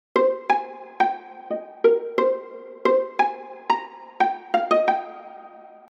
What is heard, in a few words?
Keyswitch